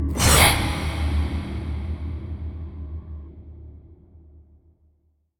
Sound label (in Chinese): 书店内 鬼差哈气